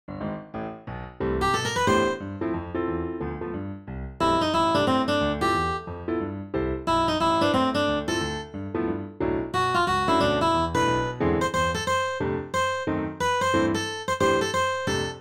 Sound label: accomp melody